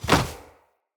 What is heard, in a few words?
Punch Whoosh, Unarmed; Weapons; Player